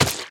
Zombie Smash

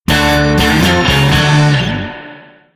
Sting Finish (D)

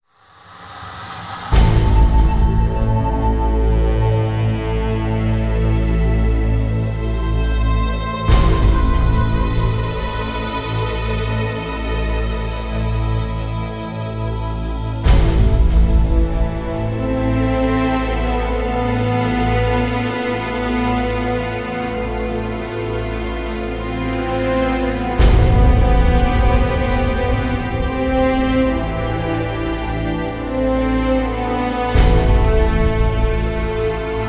victory music; oilrig; call of duty modern warfare 2

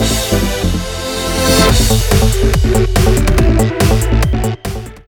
Trailer Jingle

